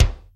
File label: THIN KIK, Jazz; Hollywood Edge; The Big Bad Beatmachine